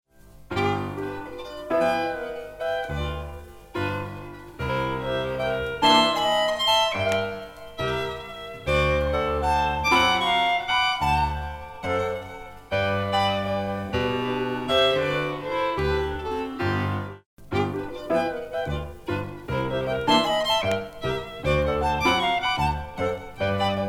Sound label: Hambo Slow Fast